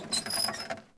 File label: Chariot Squeak; Civ4 warlords